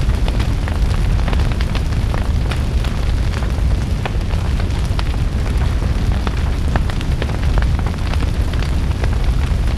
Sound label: fire loop big flame; colony; Titanfall 2